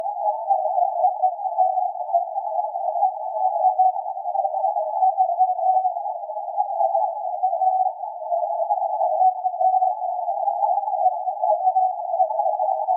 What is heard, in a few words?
CW 750Hz random noise; DiversityStereo; NR